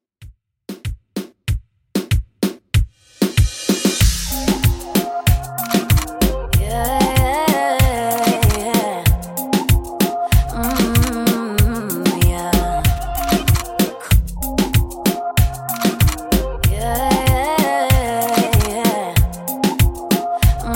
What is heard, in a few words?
Reggaeton 95 Bpm Dirty